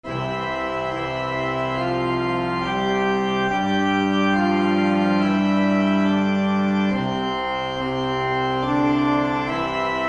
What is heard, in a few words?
ode, accordion